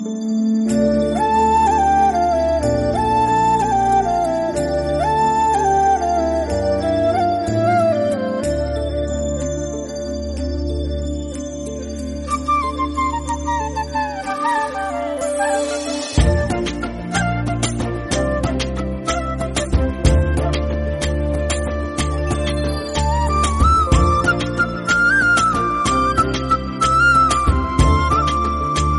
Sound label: Telugu love background